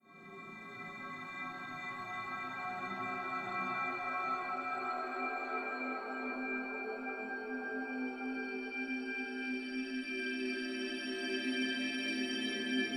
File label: Tuning Fork; LOOPERS